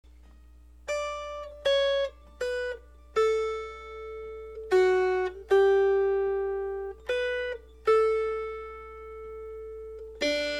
Melody

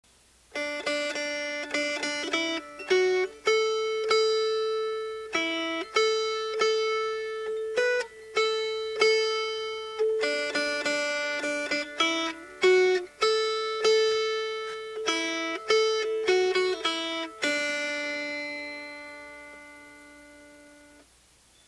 Melody

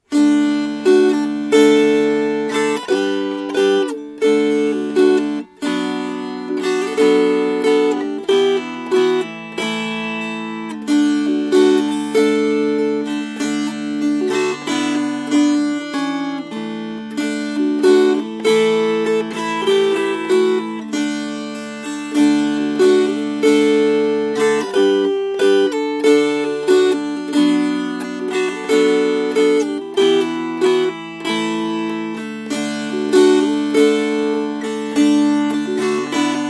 Strummed; Johnny Todd

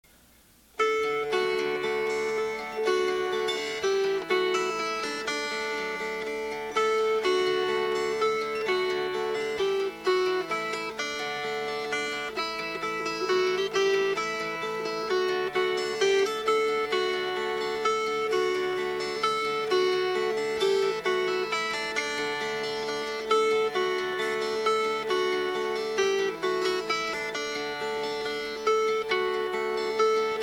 Fingerpicked

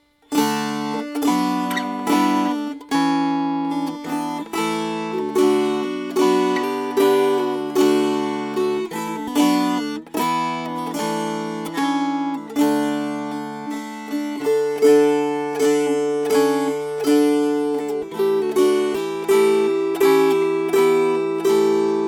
Strummed; My Country Tis Of Thee

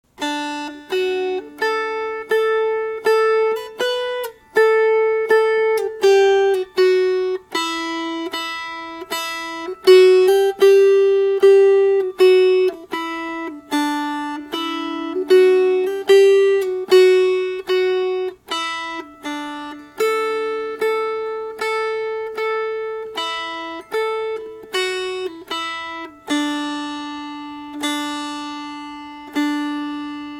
Melody; Cosher Baileys Engine

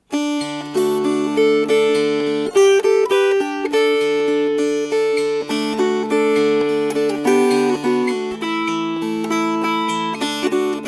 Fingerpicked; Coulter's Candy

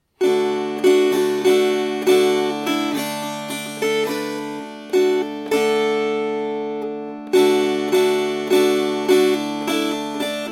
Strummed; Henry My Son

